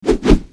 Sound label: MARIONETTE ATTACK; Eft